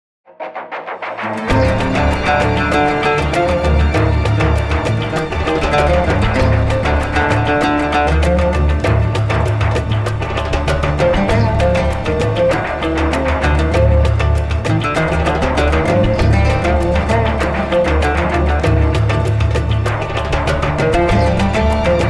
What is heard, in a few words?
Rhytm For Oud